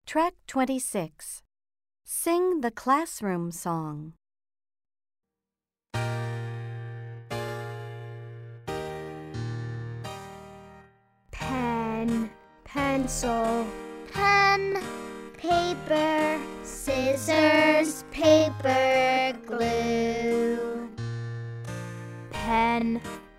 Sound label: Sing the classroom Song slow